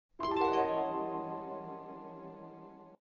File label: suspense; Family Guy